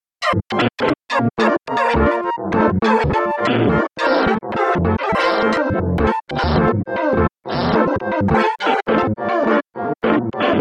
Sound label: jingle farts